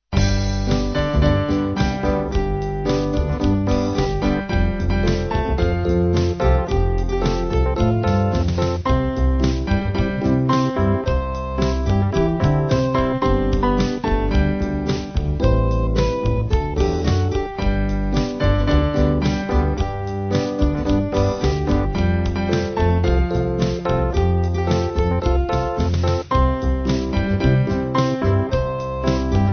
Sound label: Band